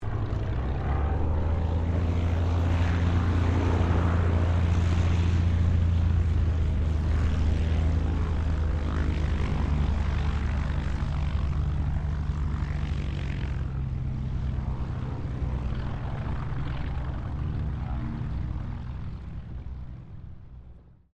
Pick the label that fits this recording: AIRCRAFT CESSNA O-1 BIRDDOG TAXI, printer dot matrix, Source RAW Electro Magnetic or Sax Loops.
AIRCRAFT CESSNA O-1 BIRDDOG TAXI